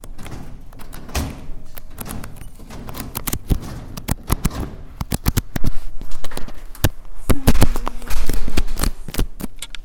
door

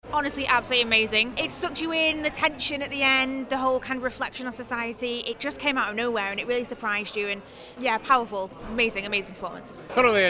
audience reaction

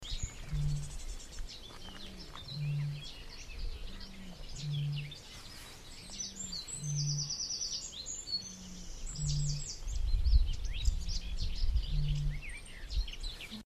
a bittern booming

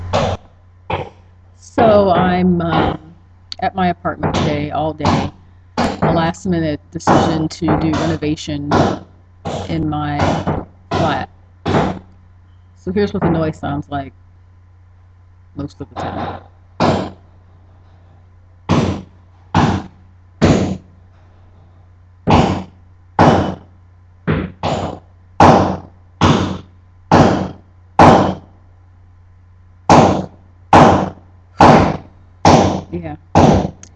bathroom destruction

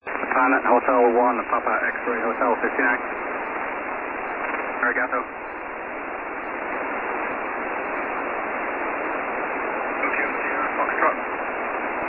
24.950 MHz SSB

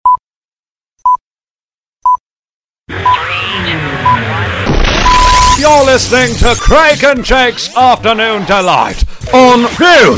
static stab jingle

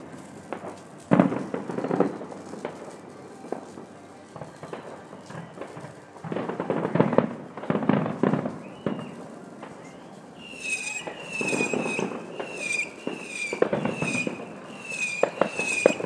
fireworks night

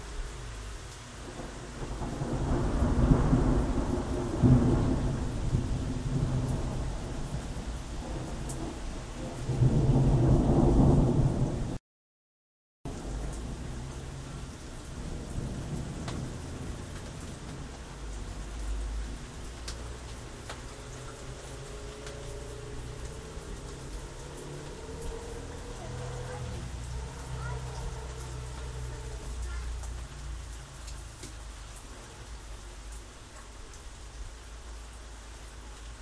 summer rain storm sounds

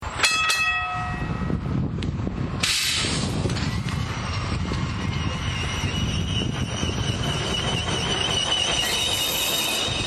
lowell trolleys sounds of lowell

